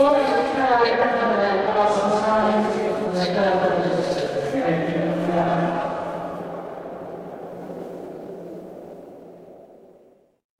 crowd murmur

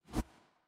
transition swoosh

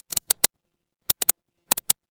office mouse click

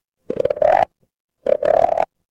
animal frog

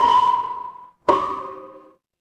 instrument wood block

